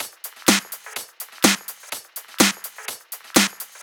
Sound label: Big Room Snare, Drum Loops, 125 BPM; Sonic Foundry (Sony Creative Software); Underground UK House & Electro